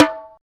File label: Bongo Lo Hard; Percussion